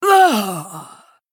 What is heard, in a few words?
Female Troll Death